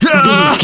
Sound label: voice male attack groan